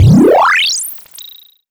charge

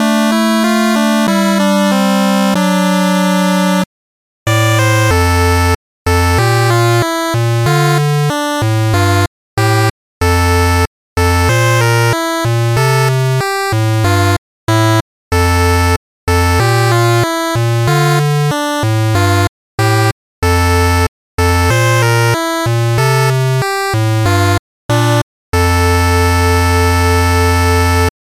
gameover

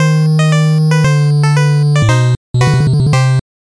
gameover

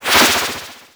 water attack

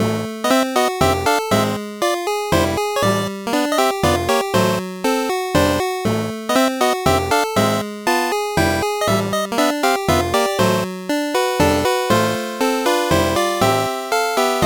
8bit

